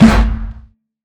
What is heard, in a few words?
grenade fire, weapons